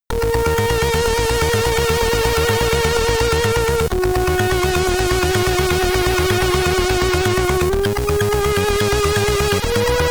SID Stereo; brain artifice; commodore 64

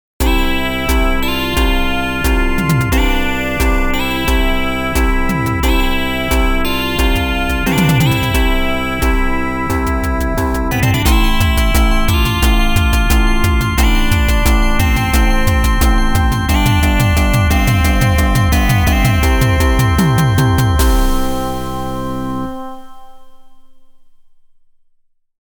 A victorious bell is ringing -BRASS-; Technosoft Music Collection Herzog Herzog Zwei

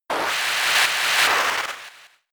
Water Gun

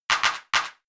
Helping Hand; attack moves; Pokemon; Gen 4; DPPL HG SS

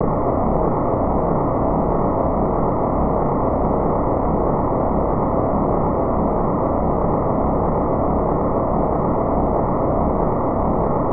Waterfall Ambience; Dragon View; SNES

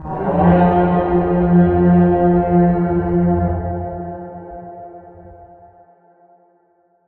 Horn; Age of Conan Hyborian Adventures; Horn of Subjection

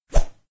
Beaver Arms Open; doodle jump 2; android

